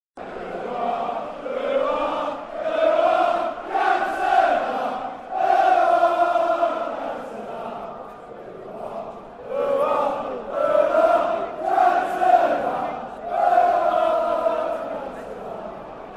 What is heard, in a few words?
Ooh Aah Chant; Cantona